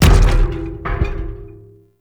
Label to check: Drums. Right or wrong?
Wrong